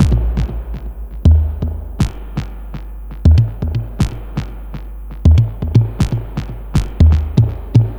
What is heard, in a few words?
Time Factor RE501 120 BPM